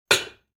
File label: pot onto stove, kitchen